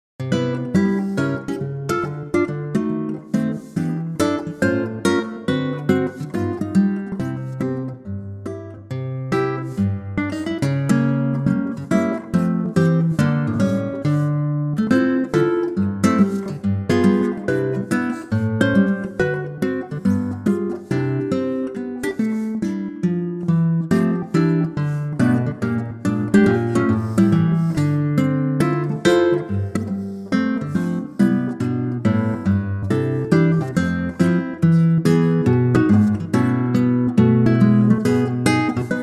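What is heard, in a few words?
acoustic guitar C; key - C - vocal range - C to D; lightly swinging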